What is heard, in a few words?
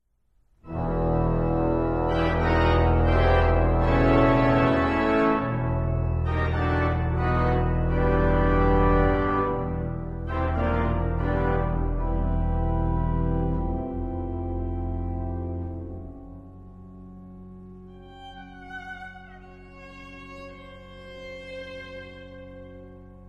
Concert Piece For Viola And Organ; John Weaver